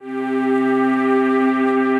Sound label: Blissful Pad, Synth Pad; Ableton; Glitch and Wash